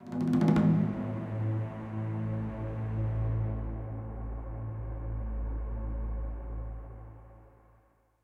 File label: Rescue Prisoner Stinger; Caves